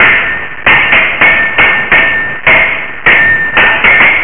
SWORD FIGHT

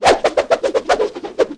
TWIRL; outlaws

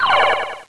DICE; VIPER Paradice

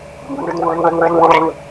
gargle beep; Wayzata Technology

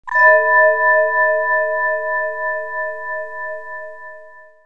Mystery Accent; KABOOM!; Music; Drama & Mystery

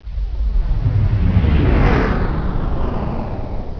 Warp Out; Final Frontier™

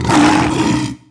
mau hit; DAoC; Toa; Gamestar

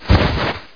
slide; SOCDEMO

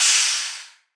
waste

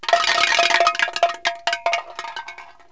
can bolt; Wired for Sound Pro CD; Aristosoft, Inc.

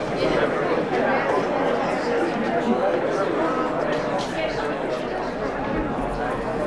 Ambi, indoor; Atennis

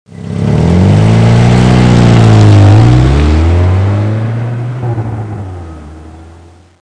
Car Accel

